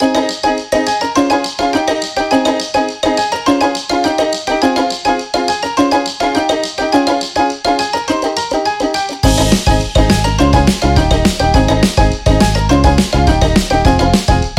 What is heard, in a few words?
Celebration